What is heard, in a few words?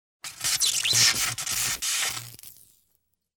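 electric effect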